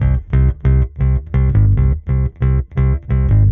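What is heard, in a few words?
Electric Bass Neck Pick 136 C1; musicradar; bass boutique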